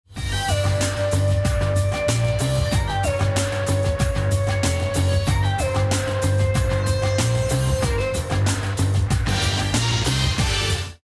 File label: bomb ten sec count; music kits custom